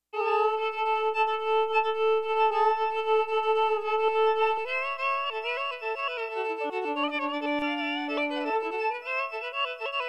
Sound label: vln